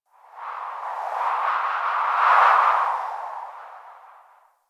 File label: Wind Gust, Ambience Plains; Warframe; Eidolon Plains; One Offs